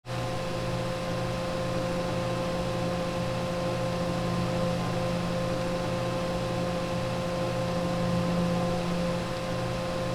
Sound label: Electric fan blade spinning medium speed; appliances